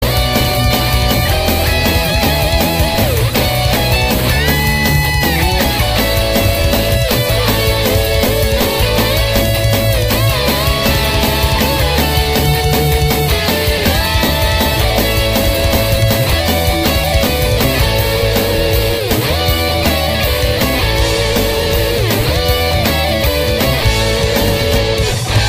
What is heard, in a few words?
christmas metal